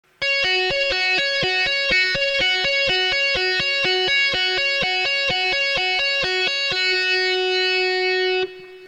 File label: tap